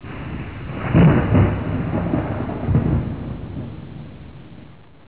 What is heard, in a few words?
thunder